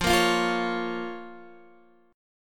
F Suspended 4th Sharp 5th Guitar Open D, chord; x,8,8,11,8,11

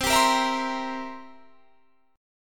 C 7th C down, C7 chord; strummed

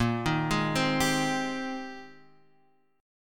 Bb 13th Suspended 4th; Bb13sus arpeggiated

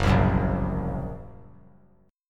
Gb Major Flat 5th, down; strummed; 3C,3F,3A,3D; JGuitar

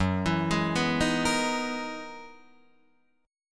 F sharp 13th F sharp Guitar Drop D

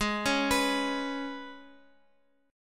Ab Minor Double Flat 5th down Guitar Open F; Abmbb5; arpeggiated

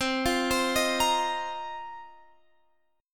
Bb Suspended 2nd Suspended 4th C

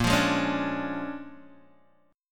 A sharp 7th Sharp 9th, Guitar, Open G

